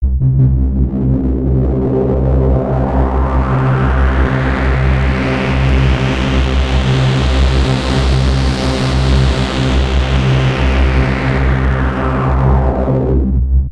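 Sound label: midibox sid v2 filter; PW modulated patch; Very static; modulate the filter cutoff frequency with an envelope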